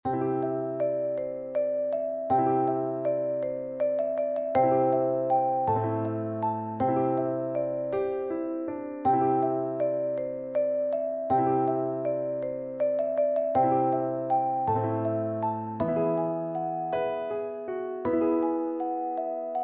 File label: vb; grieg; morning